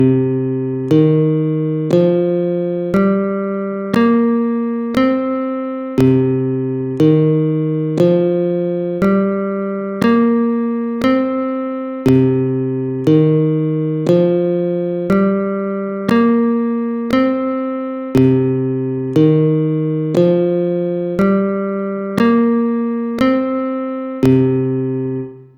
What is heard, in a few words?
C Pentatonic Minor scale